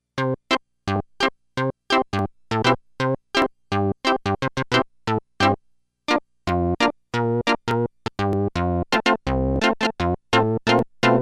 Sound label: Pizzicato